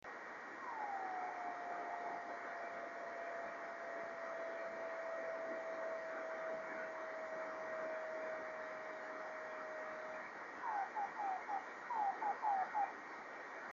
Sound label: 28160KHz